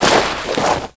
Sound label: foot dirt slide start; footsteps